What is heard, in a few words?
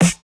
snare, snare house